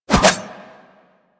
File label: Pole Arm Spin Whoosh; Warframe